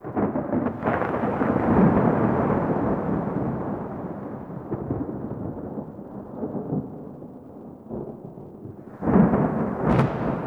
Thunder; Ballas Lotus Operator Intro; Warframe; The New War; Cinematics

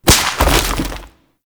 Bunraku String Drop; Warframe; Koumei

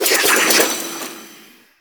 Kunai Reload; Warframe; Weapons; Choir

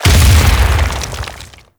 Barrier Collapse; Warframe; Brawler

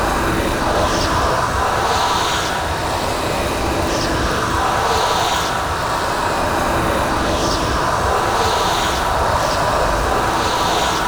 Tear Idle Loop; Entrati; Swarm

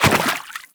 Step Water, Footsteps; Warframe; Teshin

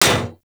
Bullet Impact Generic Enemy Shield; Warframe; Weapons; Bullet Impacts; Surface

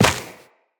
Run Dirt, Footsteps; Tenno

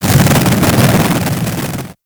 Jet Polearm Flame Charge; Warframe; Grineer

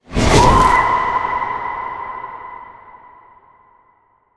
Corrupted Void Ghost Drain Aura Start; Warframe; Zariman

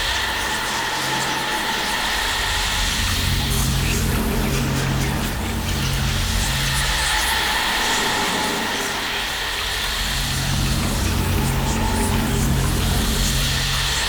Smoke Screen Loop; Warframe; Stalker

